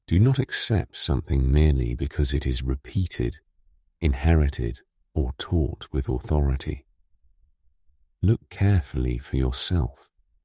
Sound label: drop, remix